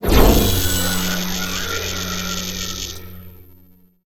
mine; Earth and Beyond; EA Games

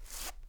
foley foot scuff; Earth and Beyond; EA Games

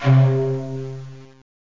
chiffer, keyboards; Zoom - Release 2; Active Software

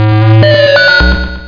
SCIFI

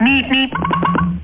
meep meep; GuessWords

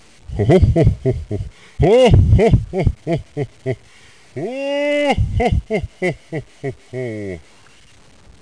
HOHO

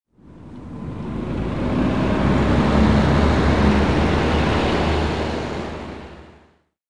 traffic

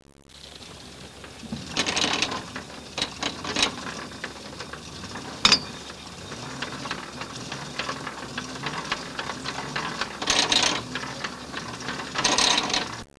unreel, fire; disaster

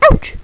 ouch; Easy Personal Web Page; PEOPLE

